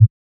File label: pickup; noteye; roguelike